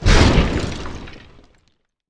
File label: jump; GrandFantasia